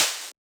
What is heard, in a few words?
Hi-Hat Heavy, Electronic Drum Samples; Hi-Hats; E-Punk Project Drum Samples